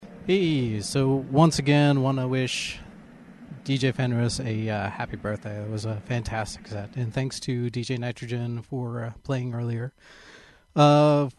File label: noise; Friday the 13th Cyberspace Birthday Stream